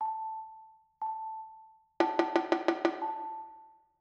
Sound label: rhythm compound 2 beat